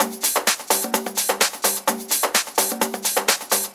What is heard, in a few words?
Percussive Loops